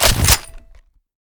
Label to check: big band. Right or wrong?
Wrong